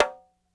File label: Petia Mute, World Percussion One Shots; Sony CS